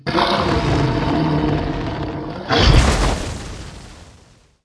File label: plant troops dead, monster